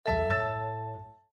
Windows Logon Sound

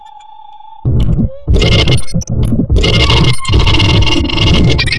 monsters; Страшный смех

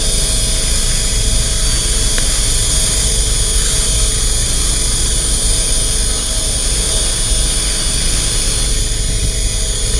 drone; Звук беспилотника; Скрипучий беспилотник

